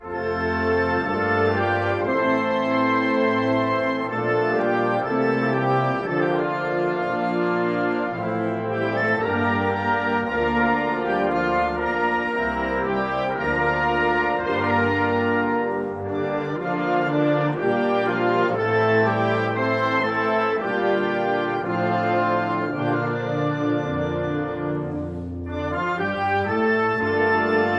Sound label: anthem